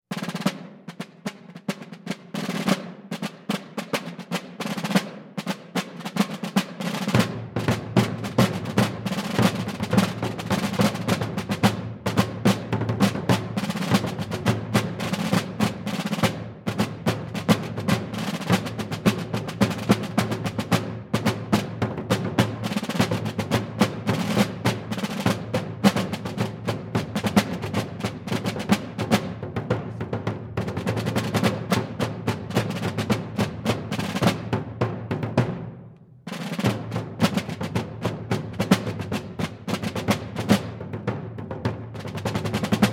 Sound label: Drum Solo; Thunder and Lightning